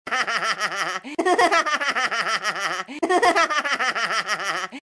hahaha

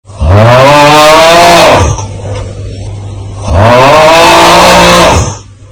augh

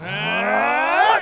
spirit sword; vegetto

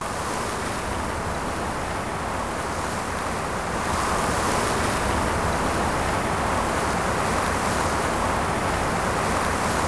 wind leaves

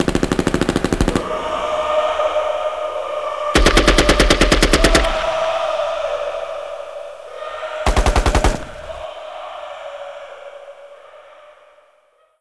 i scream

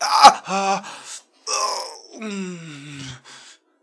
low health; doctor

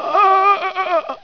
suffocate

